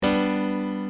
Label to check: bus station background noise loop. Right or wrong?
Wrong